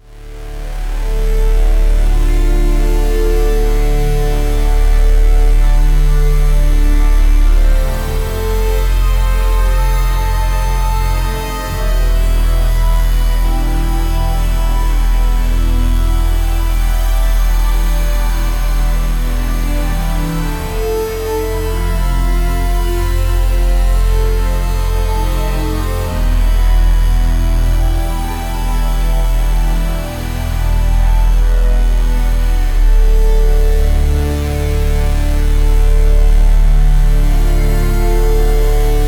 epic background sound for, adventure

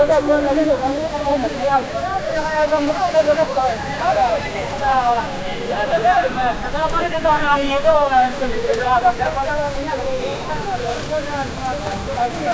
busy tamil village street, field recording

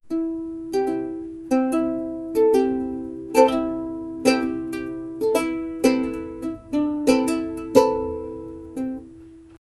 gentle ukulele chords tw; SFX Engine

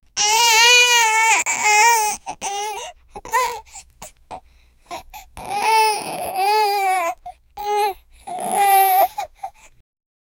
realistic baby crying sou, infant

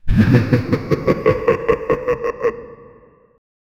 a monstrous layered demon, glitching

